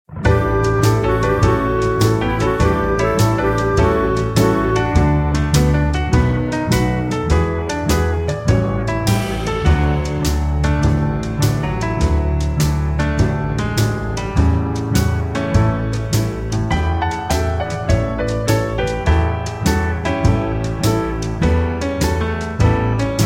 No Backing Vocals

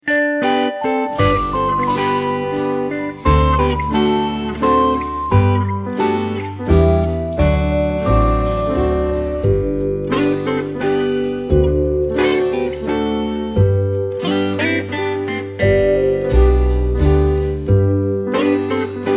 (G) No Backing Vocals

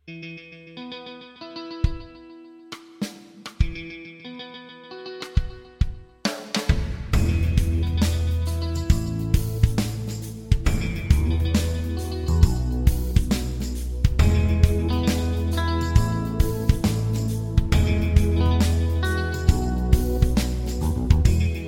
E No Backing Vocals

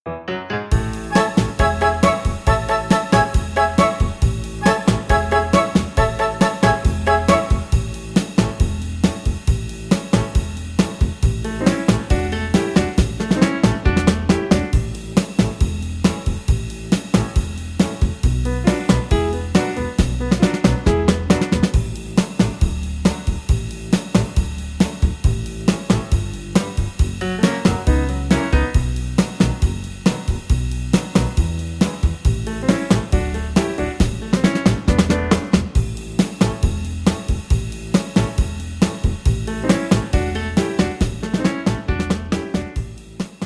A To Bb No Backing Vocals

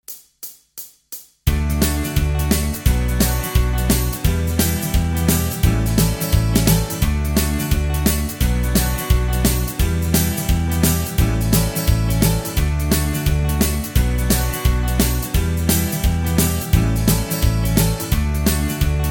(D To Eb) No Lead Guitar

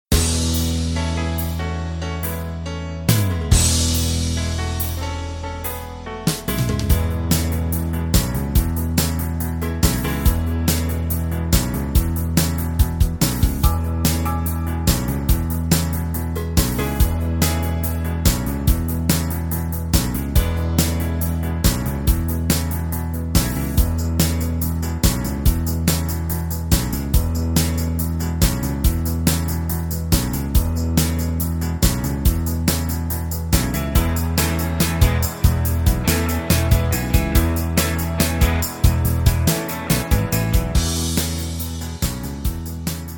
(A) No Backing Vocals

